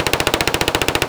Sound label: kedr loop far; Escape from Tarkov